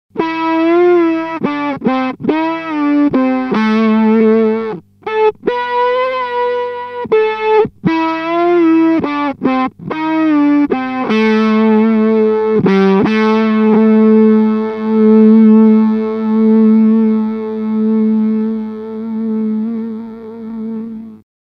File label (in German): Subdecay Pixelwave Gitarre mit Gitarrenpreset und Bendings